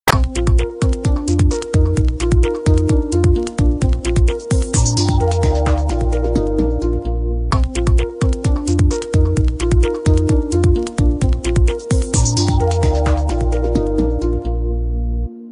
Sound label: Funky Synth Ring